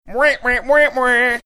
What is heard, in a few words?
making jazz trumpet sounds